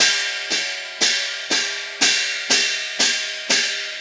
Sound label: Cymbal Pattern